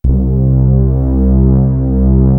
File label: PAD C2; East West - Global Sounds; SD1 PADS